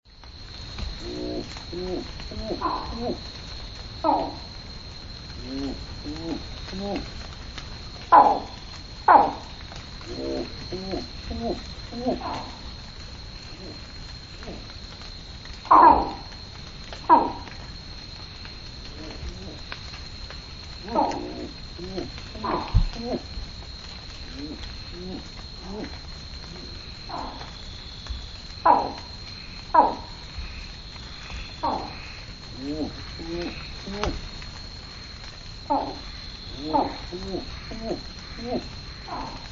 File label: horusutogaeru call; yanbaru